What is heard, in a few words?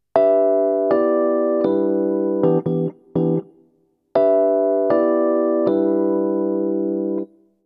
Ballad